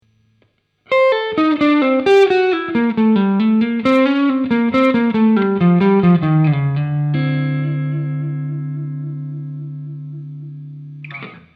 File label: Altered Scale Lick, blues guitar